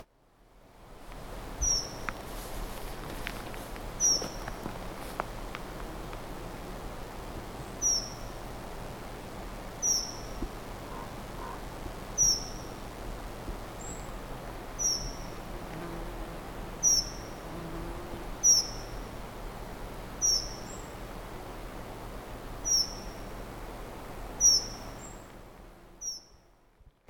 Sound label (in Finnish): rautiainen; varoitus; rautiainen - laulu / (taustalla peippo); linnut